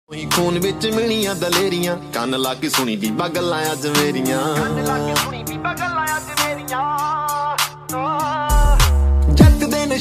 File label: DJ Punjabi Ringtone